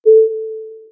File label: boop summoning sound; good janet